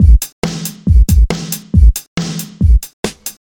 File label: Paced Groovy Funk